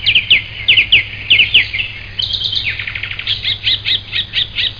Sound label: dawn chorus; creatures